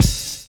JAZZY; Univers Sons

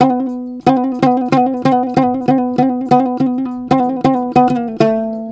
TOPSHUR; Soundscan vol.60; Asian Traditions; AKAI

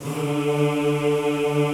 D2, Extended Classical Choir; Best Service

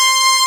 BRASS C5; Big Fish Audio; Synth City

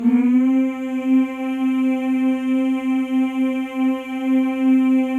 male; Spectrasonics; Symphony of Voices

